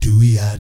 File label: DOOEEOT; Spectrasonics; Vocal Planet; Jazz FX